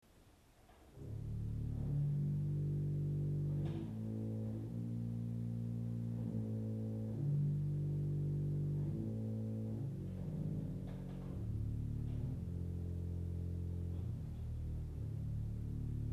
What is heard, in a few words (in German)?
Violon 16, Orgelstimmen